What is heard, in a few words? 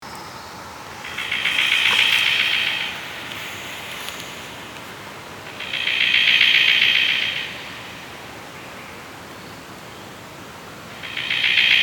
Prionodura Newtoniana; Golden Bowerbird; Call; Call 2